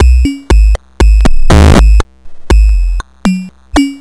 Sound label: Structured Rhythm, IDM Rhythms